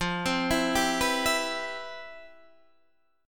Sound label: C Major 7th, F, guitar chord; Cmaj7/F; arpeggiated